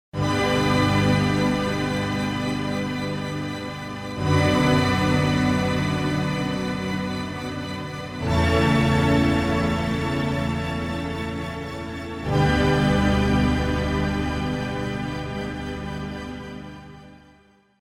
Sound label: pad clear, Roland JV880